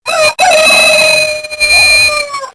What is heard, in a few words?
Chord B